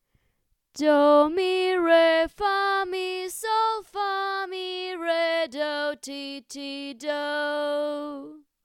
solfa melody

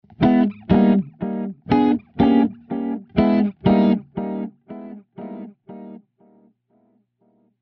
Multi Head Tape Echo

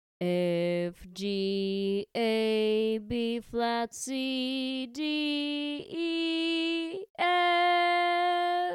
sung scale; Melody 2

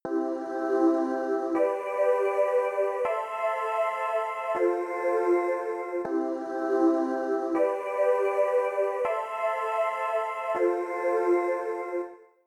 CHORD; Example 1; song-writing

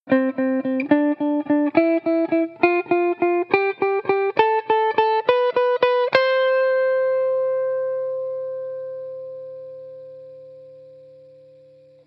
scale example

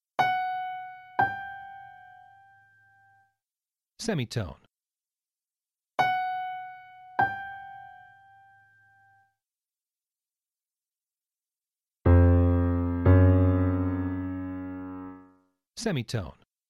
1 Semitone up

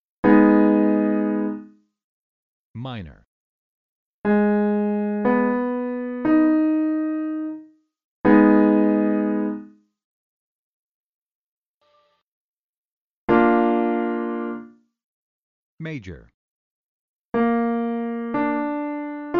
Labelled Chords

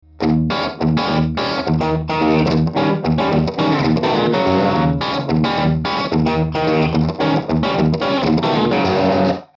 marshall jtm 45 mild crunch; Dominant 7th chord vamp

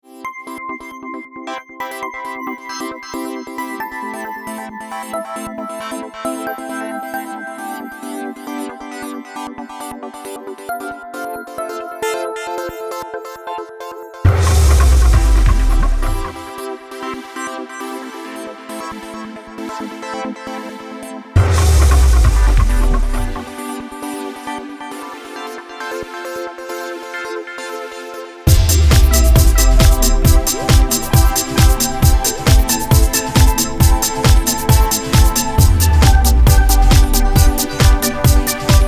I vi V IV Dance C

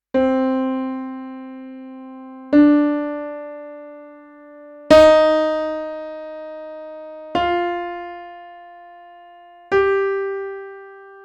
C Minor

